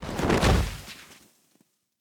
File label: snow body fall